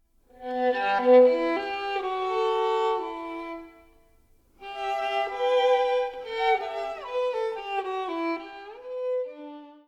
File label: cadenza adagio